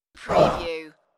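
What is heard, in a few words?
Man Ah Groan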